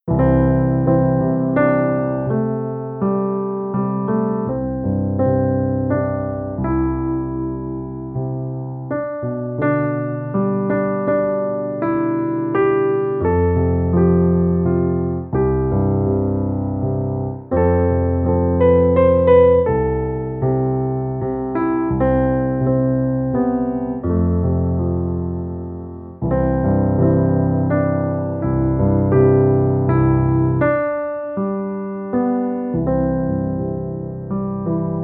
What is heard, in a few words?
Therapeutic Music Gospel